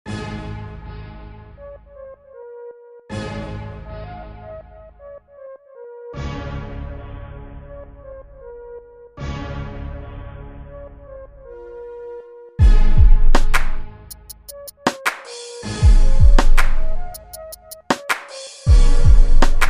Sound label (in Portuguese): intrumental